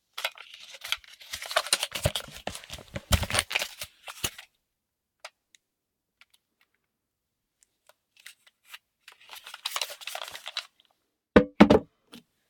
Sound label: Foley Drill Movement, Set Down; Drill - Foley & Bursts; Jake Fielding